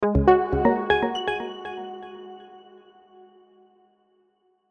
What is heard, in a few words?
Synth Accent Soft; Musical; Synths; Blastwave